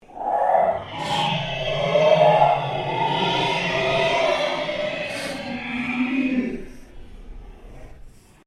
Ambient Drone Ghost Chatter; Sound effects created by manipulating other sounds; Scary Soundscape; Scary Soundscapes

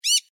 Bird Rainbow Lorikeet Call, Australian birds; Animals

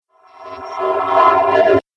Space Impact Reverse Strike; Sci Fi Sound Effects

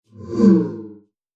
Future Vroom, whoosh sound effect; Future Vroom 11

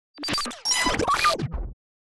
Distortion Left To Right

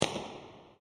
Short Firecracker Bang; Firework; Backgrounds; CraftedSignals

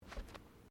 Footsteps Bare feet Carpet Step; Footstep Foley; Human

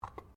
JAR GLASS LID PLASTIC PICK UP; Various kitchen jars; Pick Ups (Sound Effects:Objects)

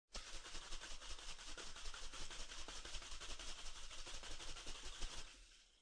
Foley, Aerosol Can, Shake Can Liquid; Shaking; Objects

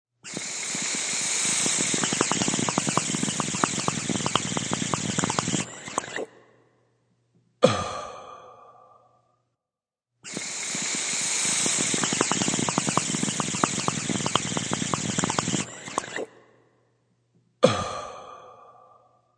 Waterpipe Exhale; Novelty Ringtone

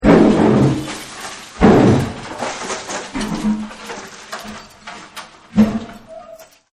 Knock It Down, smash; Smashes; Objects; Partners In Rhyme

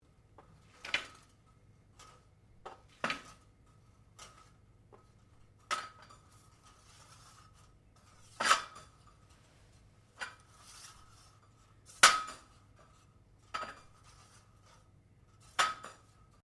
Blinds Venetian Movement, Household blinds; Living Room

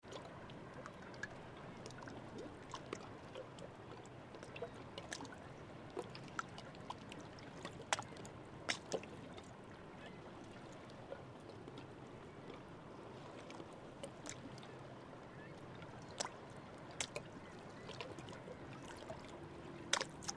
Water Laps Stone Pier Light; Nature

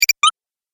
Collect Chiptune, video game

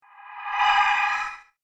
Subtle Solar Sweep; Sci Fi Sound Effects; Science Fiction Sound Effect

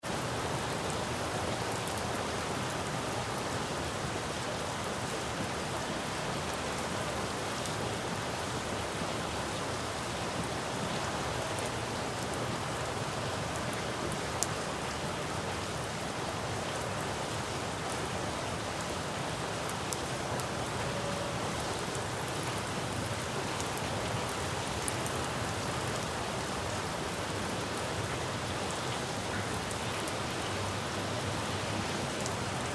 Rain Street City Splatty; Weather; Big Room Sound

